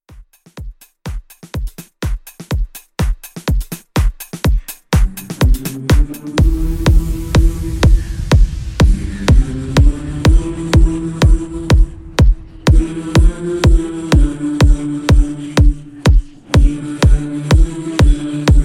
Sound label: Dance; Remixfreakz; Tiktok Dance Redrum